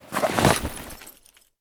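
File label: inventory backpack; STALKER; IWP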